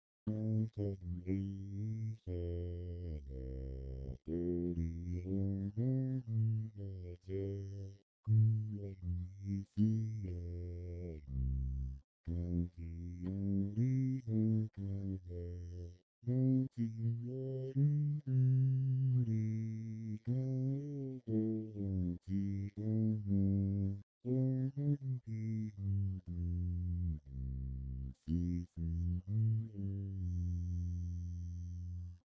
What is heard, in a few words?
Guitar; MEIKO-Guitar2